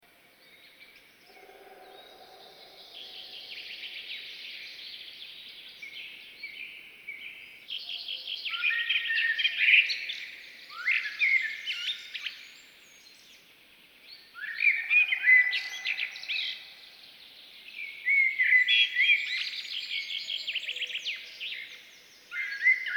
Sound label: pine forest